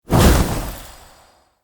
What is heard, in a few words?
Casting Device Cast Heavy; Galene